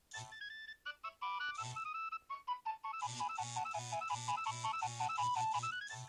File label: nokia 6100 ringtones trepak